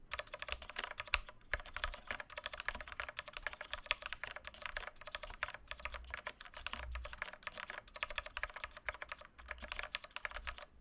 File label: cherry black, mechanical keyboards